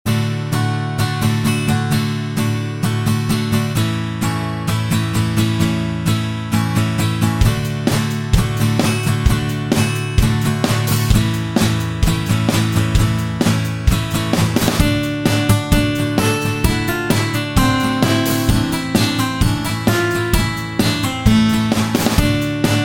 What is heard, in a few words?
acoustic guitar; ringtones; latino